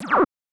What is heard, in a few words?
SPACE FX 140; SpaceSounds